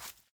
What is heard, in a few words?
baby turtle shamble; mob; minecraft